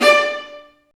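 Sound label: Marcato, Strings; Vlas Marcato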